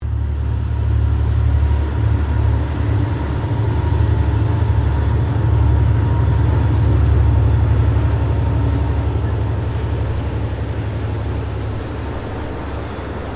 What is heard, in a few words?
Train Diesel Freight Passing Beneath, Freight train passing below; Trains; Vehicles